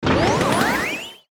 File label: ASCEND DIGITAL, Ascend, Record, Speed, Up, Crackle; Multimedia; Production Element, Imaging Element, Accent, Transition; Blastwave